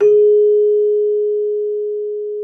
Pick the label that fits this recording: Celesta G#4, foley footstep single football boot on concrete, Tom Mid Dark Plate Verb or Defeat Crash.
Celesta G#4